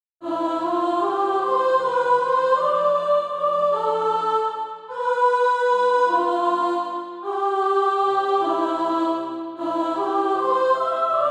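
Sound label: soprano, SATB Practise Music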